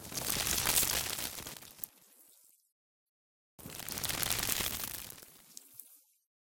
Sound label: Slime burn trigger; stray